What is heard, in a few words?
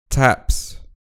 taps